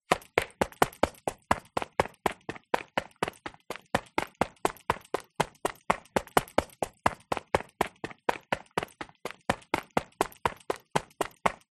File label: footsteps running street; foley